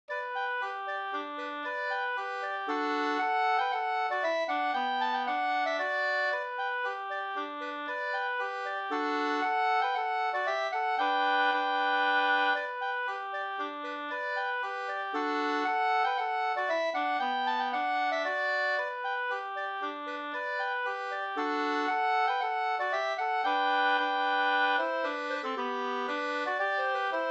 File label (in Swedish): polska, 2st; Axel Wester V Vingaker